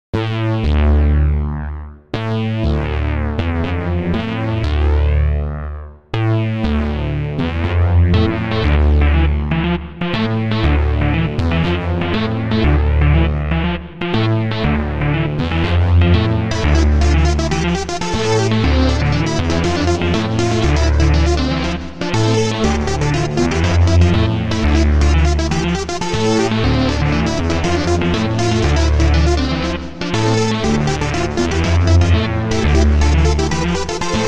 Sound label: pure FM song, synthesizers